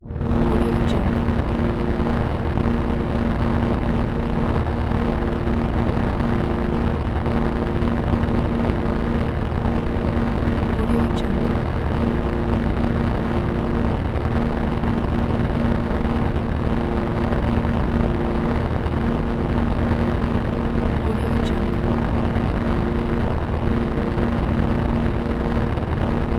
scifi flame; soundcreator

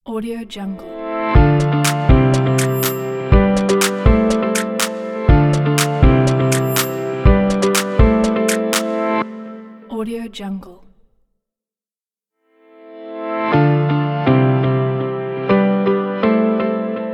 modern logo intro sound; terrasseproduction

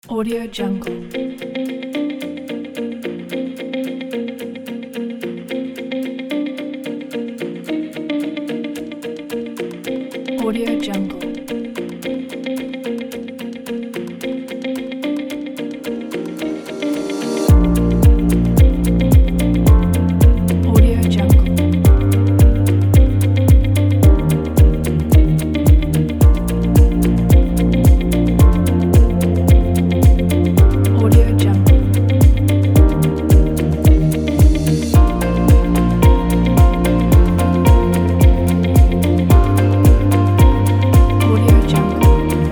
happy corporate kit

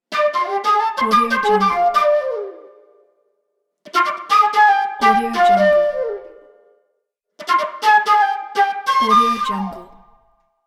jazz flute

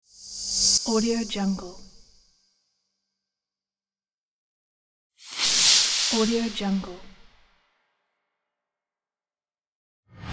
cinematic swoosh

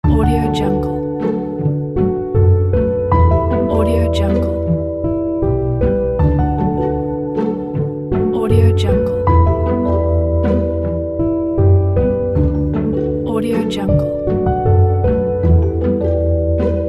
pizzicato loops

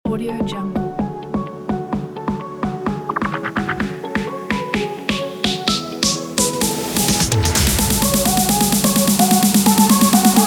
energetic dance

